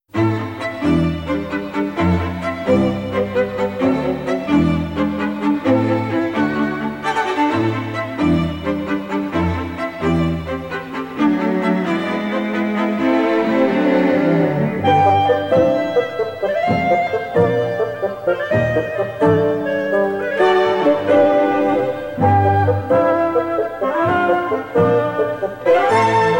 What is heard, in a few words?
Strings Version; サントリー・ウィスキー『オールド』